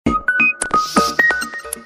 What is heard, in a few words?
Samsung Galaxy M32 Sms Ringtone